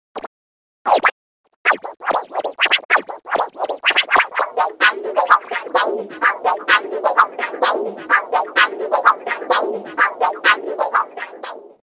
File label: scratching mix